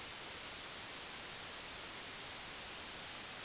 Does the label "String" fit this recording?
No